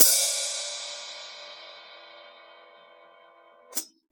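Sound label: RAW overhead, Drums Acoustic; AKAI; 90s sample CD; USB Soundscan vol.10; RAW KIT 3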